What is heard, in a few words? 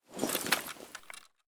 assault holster, weapons; STALKER; IWP